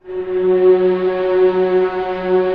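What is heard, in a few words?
strings F#4 PP R; Sound On Sound - Peter Siedleczek's Orchestra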